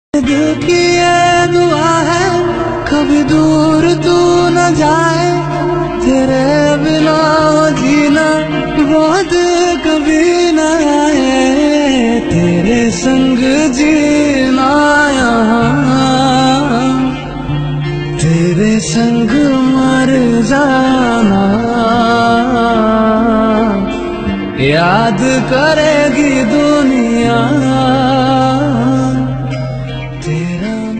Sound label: Heart Touching Song Ringtone